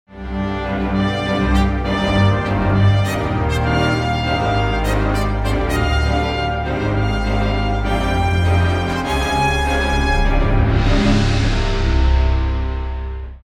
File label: Miniature for Orchestra